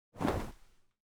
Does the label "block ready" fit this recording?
Yes